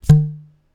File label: Pipe